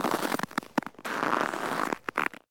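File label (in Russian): скрип снега морозным утром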